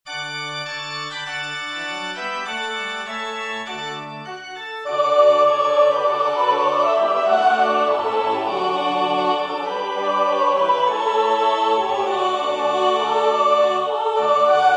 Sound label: Descant; Joy to the World